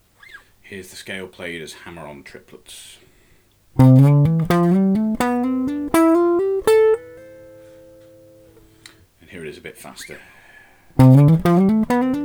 c major scale hammer on triplets, guitar

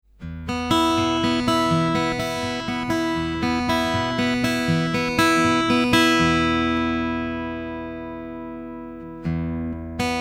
Thumb first finger 2nd exercise, guitar

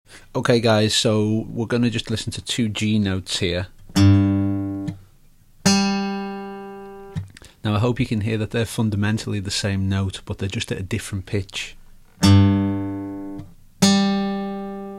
guitar scales

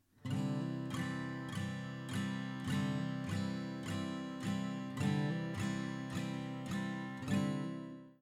hammer on c, guitar

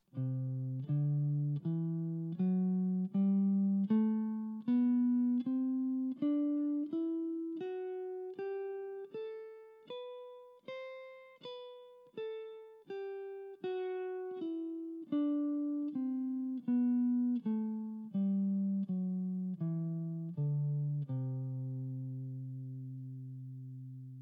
C Lydian, guitar

